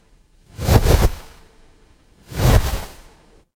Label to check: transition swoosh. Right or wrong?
Right